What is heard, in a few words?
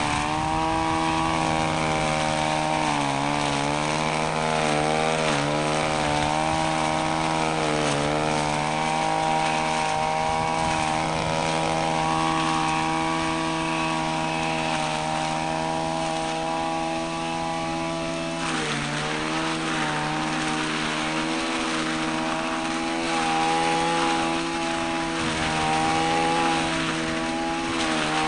glass, soundscape; radio work